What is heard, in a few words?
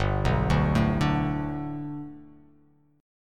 G Major Add 9th chord